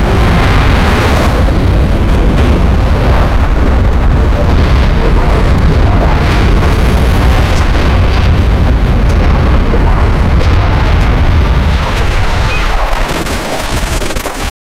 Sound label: the middle ages, battle cries